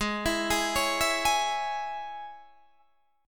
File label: Ab Suspended 4th Sharp 5th Guitar DADGAD